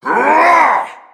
shout middle

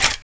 clip out; tfa cso

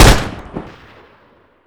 discharge, turret

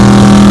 charger low; charger69; master chris

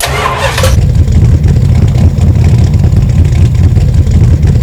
simulated vehicles idle

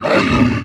vox attack

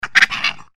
giant rat attack; npc

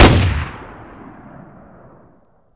turret shoot shot close

